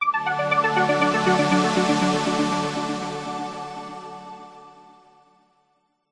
multimedia musical logo branding signature medical science tech arp into warm pad